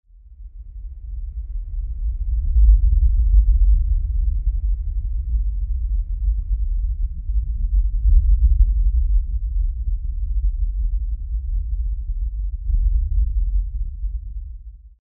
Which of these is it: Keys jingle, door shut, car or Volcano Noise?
Volcano Noise